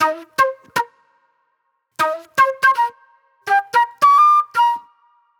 Fast Funk Flute

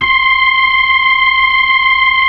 B-3, Keys of the 60s and 70s

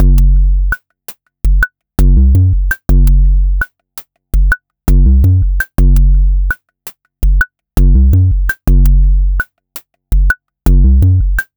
Synthetix, Processed Percussion

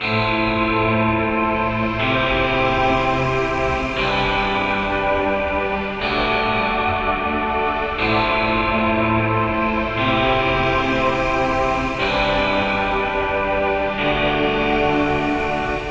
Monster Stack (Progression 2), Keyboards

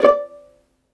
violin E; ILIO; Synclavier Strings

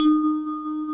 PPG 2.3; DIGITAL1 HYB

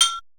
Perc; Coldcut's Kleptomania VOL1

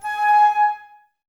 Flute, Woodwind

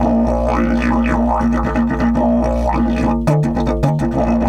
108, Didgeridoo

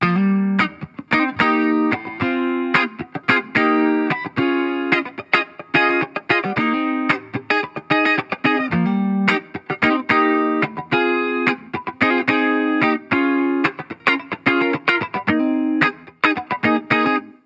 Guitar Bpm110 Key G#, 70s Guitars; Fox Samples - Back to the 70s Guitars

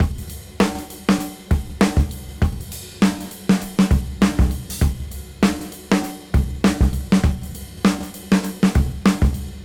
Swing 98 BPM; AMG; Keith LeBlanc Phat Butt Jams

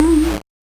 Noise; musicradar; 8-bit bonanza

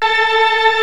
Organ, Barton Morton Full

